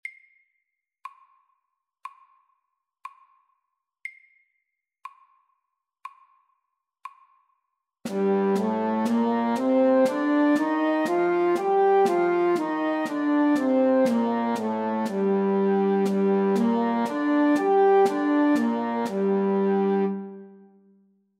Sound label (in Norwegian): G moll SAKTE